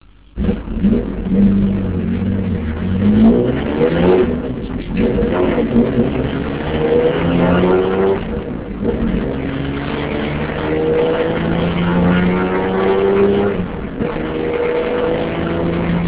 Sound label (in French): 993 rsr, Porsche, A bord; (1995)